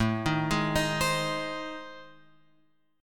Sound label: A Minor Major 7th Flat 5th; AmM7b5 Chord {5 6 6 8 X 8} arpeggiated